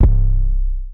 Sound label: kicker; Heartbeats